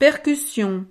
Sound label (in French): percussion